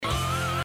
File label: AAAAAAAAA